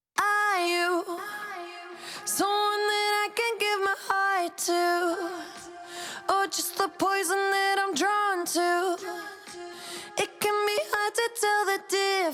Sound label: Acapella